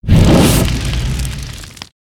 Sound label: SFX fireblast